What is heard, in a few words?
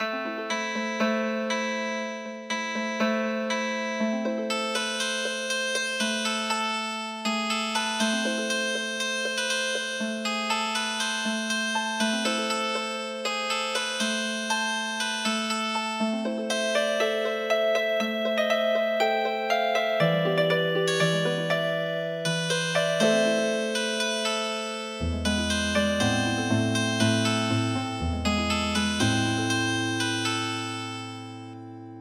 Healing Harp, midi; Ninnia Nanna